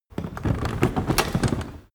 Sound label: cart medieval drag start stop